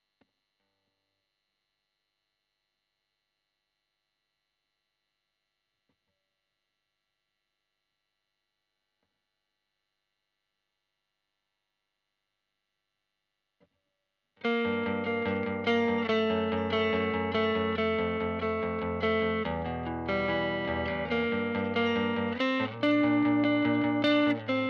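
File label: Guitar Royer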